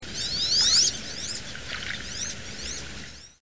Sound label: wood duck